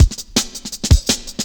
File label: Cut, breaks